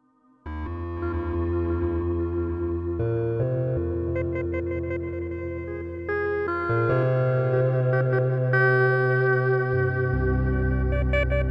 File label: Sync Harmony; Chroma